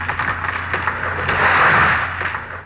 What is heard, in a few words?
treefall